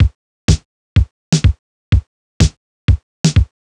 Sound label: drum loop room stripped 125, Classic Chicago House; Sample Magic; Drum Loops